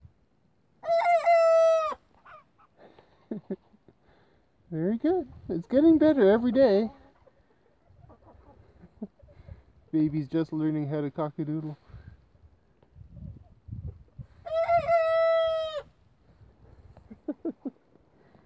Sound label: learning to cockadoodle